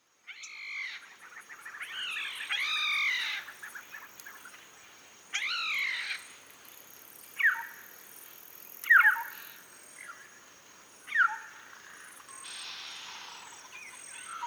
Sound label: Late Afternoon East Africa